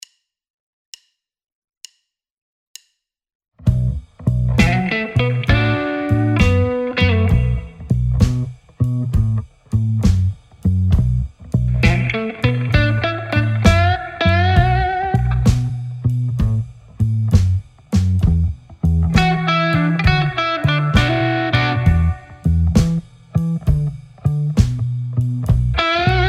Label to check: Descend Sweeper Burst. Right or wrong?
Wrong